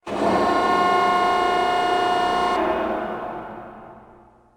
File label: repair, ships